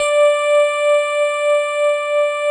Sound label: Dx Piano Chord D5